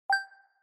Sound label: Onoma Inspiration Mid Low